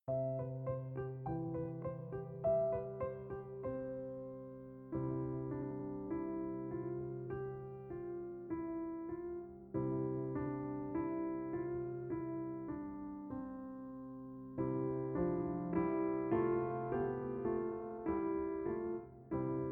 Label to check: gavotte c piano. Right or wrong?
Right